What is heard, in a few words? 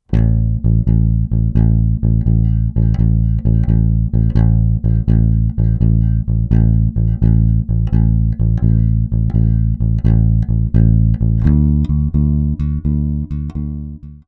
Bass Shuffle Rhythm Example; 7-Day-Bass-Challenge